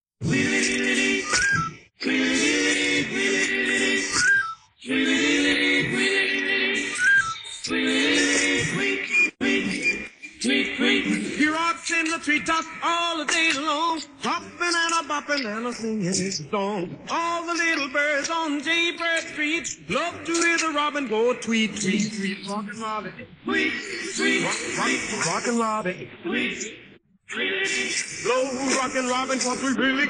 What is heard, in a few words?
vocals